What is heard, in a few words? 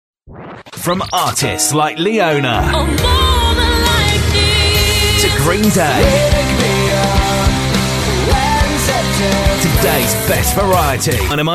jingle